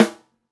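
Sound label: snare, Roland MV8800 drum kit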